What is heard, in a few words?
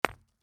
Hit Rock With Sledgehammer, Hitting Rock With Sledgehammer; Low , Breaks; Objects